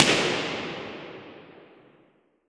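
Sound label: Impulse Response, Nikolai Church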